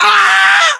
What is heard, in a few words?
death, english; voiceovers